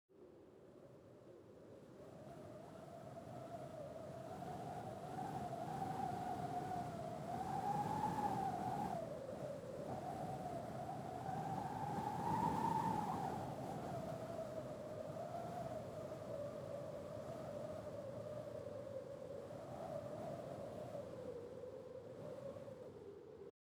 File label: ambience arctic windy; Rust